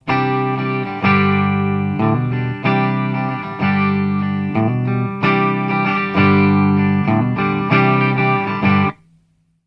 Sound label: clean